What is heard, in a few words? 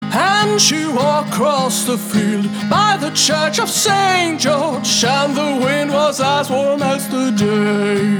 Ringtone